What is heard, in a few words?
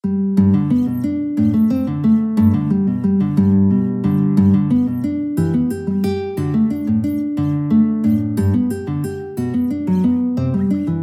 guitar